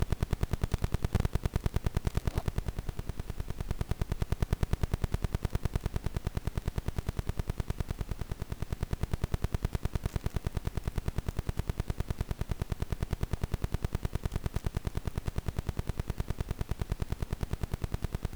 Wi-Fi Interference; SFX; IRL Recorded

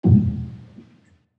Distant Hit Wet; SFX

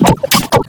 Audio Corruption, Electronic

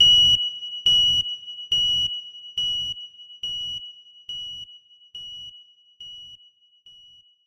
Cashier, Beeps; SFX

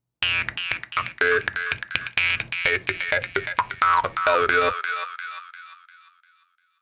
Jaw Harp; SFX; Experimental - Other

